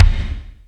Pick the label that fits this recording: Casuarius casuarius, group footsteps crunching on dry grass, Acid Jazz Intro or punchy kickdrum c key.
punchy kickdrum c key